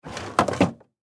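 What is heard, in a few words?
zvuki holodilnika, refrigerator sounds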